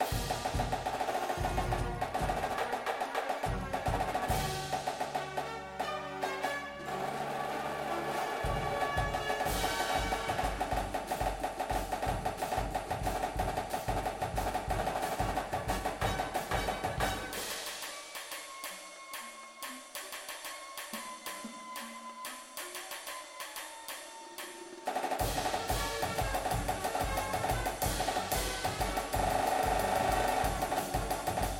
snares with music

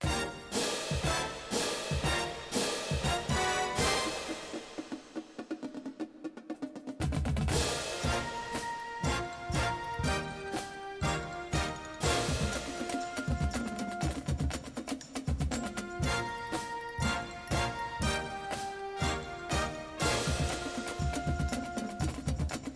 cymbals w music, drumline

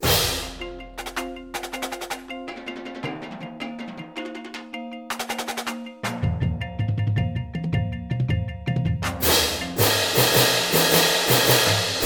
cymbals w music

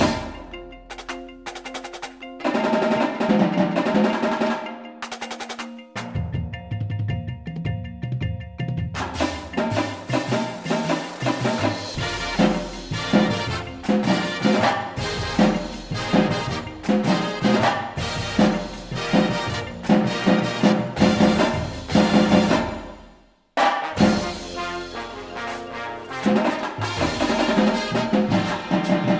tenors w music, drumline

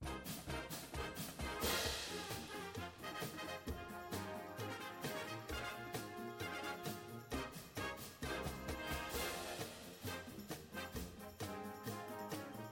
cymbals w music

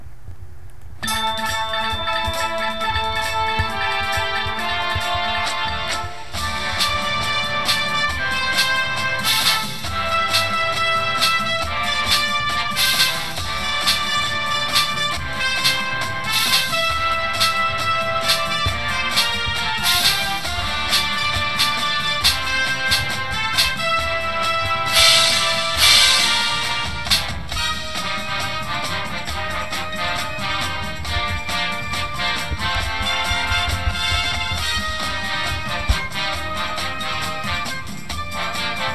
cymbals with music, drumline

